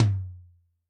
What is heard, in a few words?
Maple, Yamaha DTXpress-II Tom